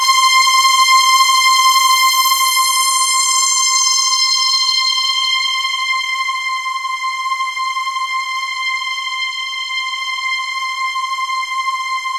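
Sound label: ETHERAL, Kurzweil K2000; BASIC-KW2000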